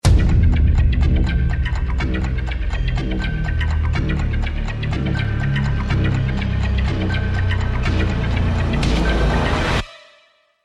bomb ten sec count

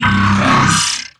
dry, strider, combat, movement grunt; Half Life Alyx

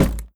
impact, luggage big

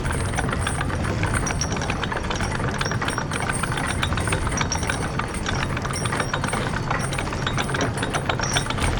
move lp, pallet winch

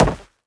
step run, footsteps, player, carpet

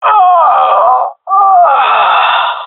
death, jungle male; player vo; Counter Strike Model Pack; CS:GO

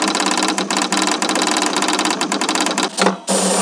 equip, ambient tones

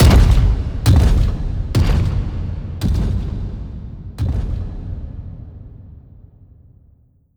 strider walk off; creatures; Half Life Alyx

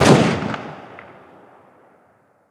g3sg1 distant; weapons; Counter Strike Model Pack; CS2

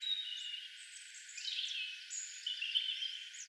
birds 1shot; animal

